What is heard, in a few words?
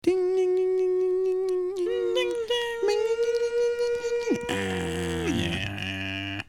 Tuning Song